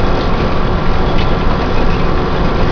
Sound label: conveyor loop